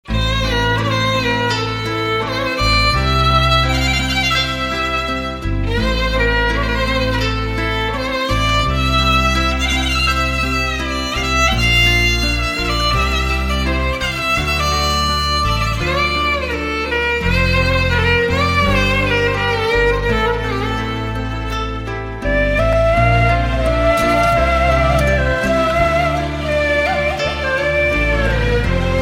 arabic turkish music

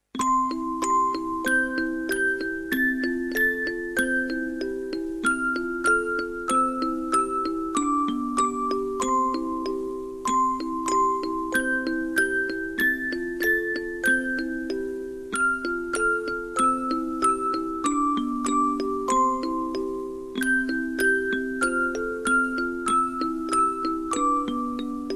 music box

